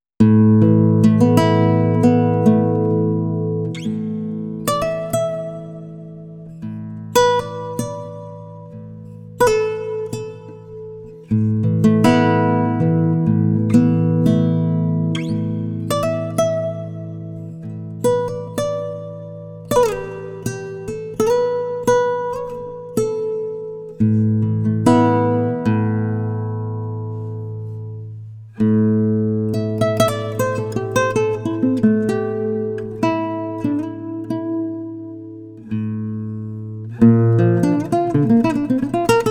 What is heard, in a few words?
acoustik fx; tc electronic sk48